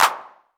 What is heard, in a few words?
Electro Kit Clap